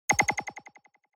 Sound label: Onoma Surprise Low Long